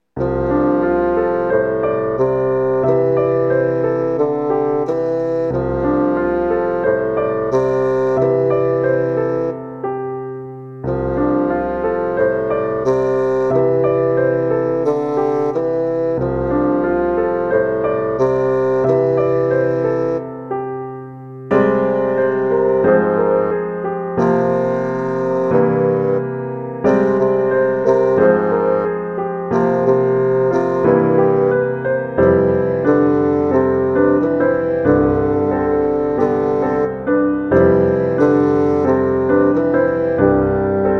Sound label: Rumba; Peanut